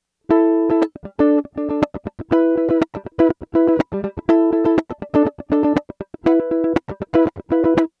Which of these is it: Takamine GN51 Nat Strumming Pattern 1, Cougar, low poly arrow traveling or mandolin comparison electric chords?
mandolin comparison electric chords